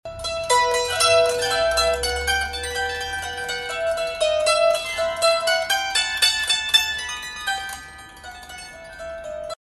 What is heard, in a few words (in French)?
Psaltérion